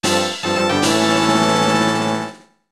fanfare small; svencoop; zelda

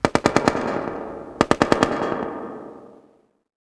battle noise; firearms; thanatos

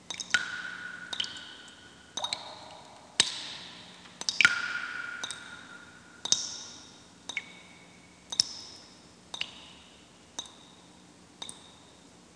trailer drip echo loop; cstrike; kif metro

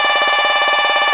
zip machine; ambience

